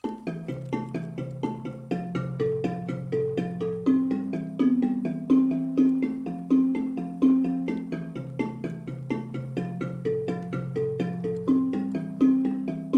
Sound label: bari b part, marimba